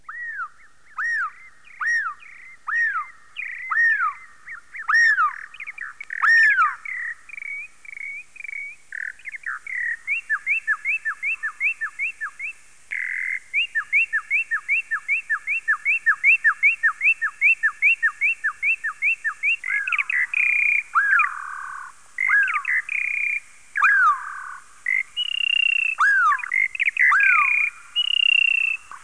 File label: ambien